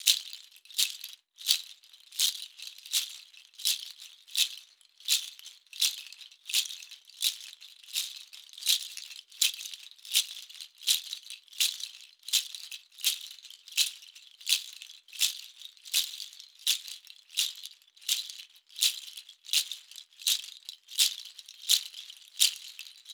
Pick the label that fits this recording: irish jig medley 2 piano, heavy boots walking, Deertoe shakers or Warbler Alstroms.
Deertoe shakers